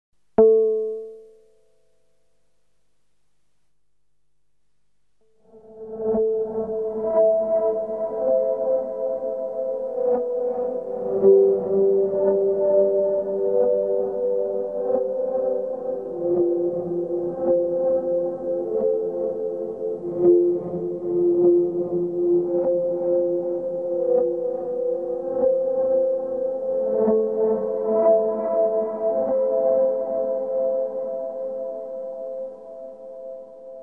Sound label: MicroFreak Toy Piano Preset Source Audio Ventris Reverse Reverb Full Wet